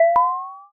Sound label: ui confirm